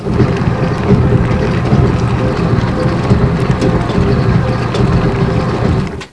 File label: WHEEL